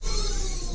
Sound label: move; Apotheorasis